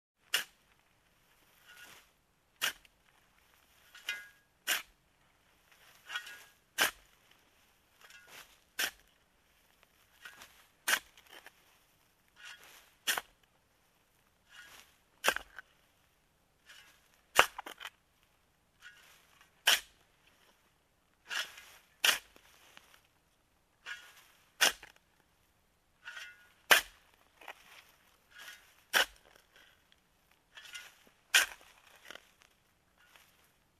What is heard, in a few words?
Digging a hole with trowel